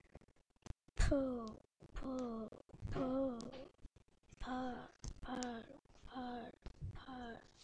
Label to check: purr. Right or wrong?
Right